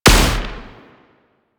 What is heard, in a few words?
Shot; DE; Warframe; Weapons; Archwing; Arch Anti Aircraft Gun; Kuva Grattler